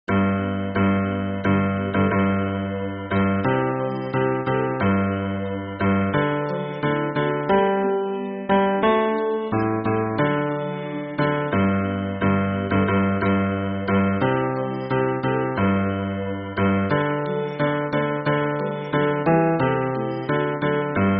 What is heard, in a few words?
hymn bass